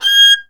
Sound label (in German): GEIGE, Alpine Volksmusik